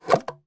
food drink plastic drinking straw pull out lid when removed cup; macdonalds